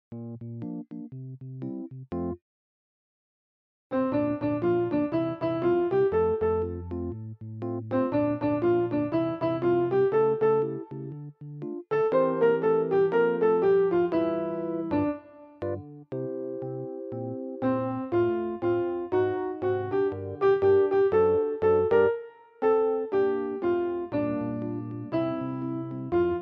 Sopran; Edderkoppespind

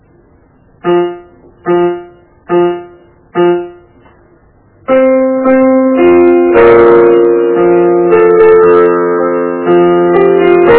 velsignelsen - melodi og akkompagnement

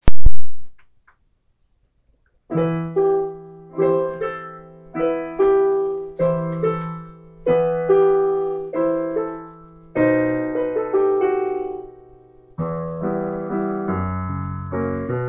vaarvisen akkompagnement

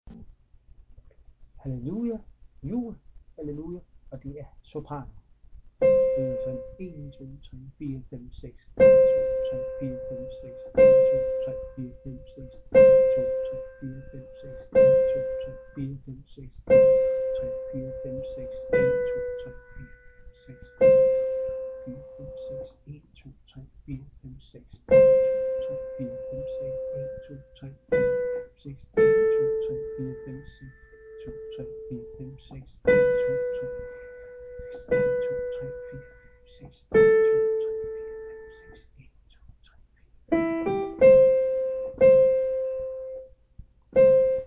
sopranen

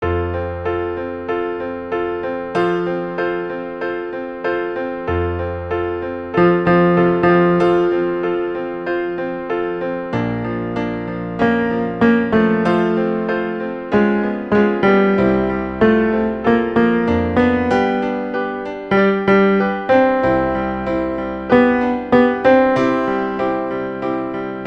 Bas

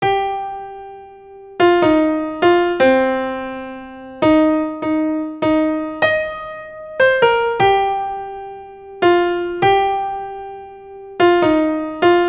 SOPRAN; Deep river

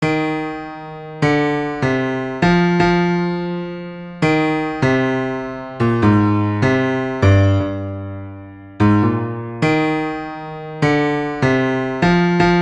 BAS; Deep river

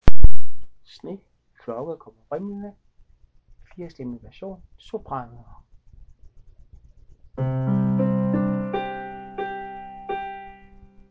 sopran - satb

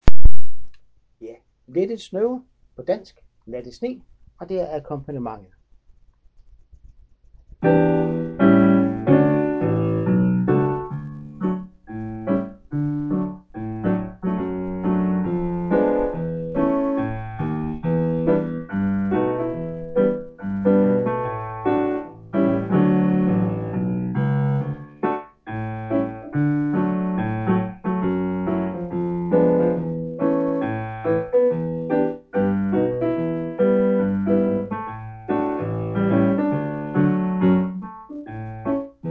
akkom

